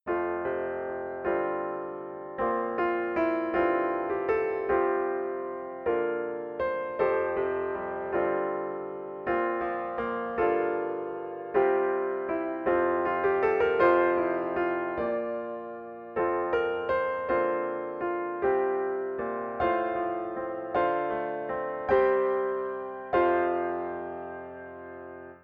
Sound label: solo; Praise to the Lord